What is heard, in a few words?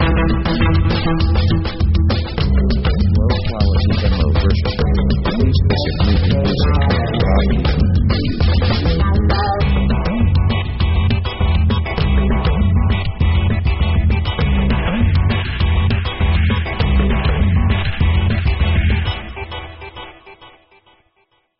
march of the machines